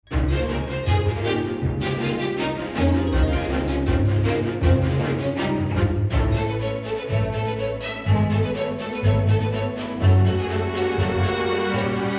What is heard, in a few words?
Classical